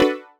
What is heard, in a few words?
chord one shot shimmer D, Classic Chicago House; Sample Magic